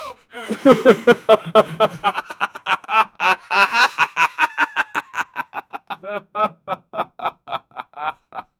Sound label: men laughing bullying; laughter